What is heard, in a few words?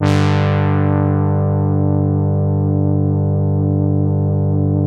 QUINT HORN G2, HARD BRASS; Music Store - Claudius Diemeter Schwerlos